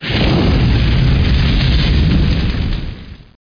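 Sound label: BURN LARGE; TA Theme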